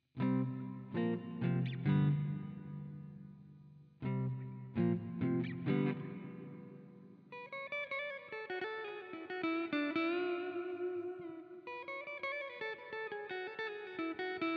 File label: room cavern